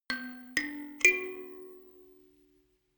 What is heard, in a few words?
notification kalimba rattle triad up minor low